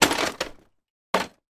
industrial hand cart bounce down kerb then stand upright with boxes on; sound effects one